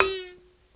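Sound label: BENT MARIMBA F#4; Spectrasonics; Heart of Africa 1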